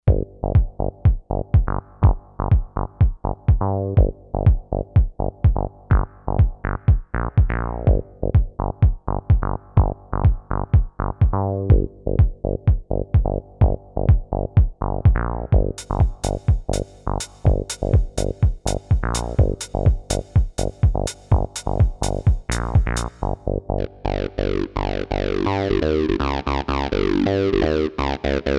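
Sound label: Drum+Bass, Kawai K5000S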